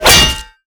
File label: blade